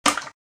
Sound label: slime; creature